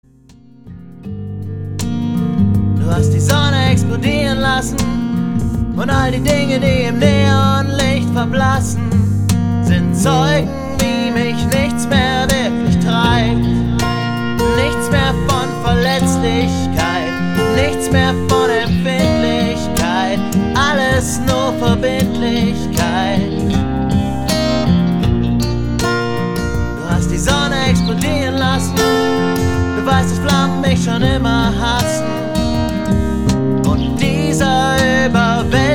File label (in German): Applaus; motovun